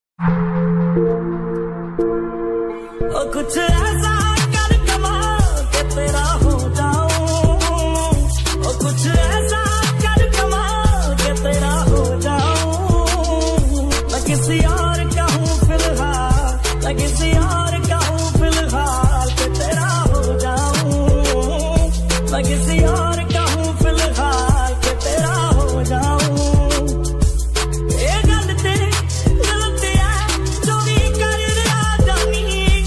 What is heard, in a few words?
Bollywood remix song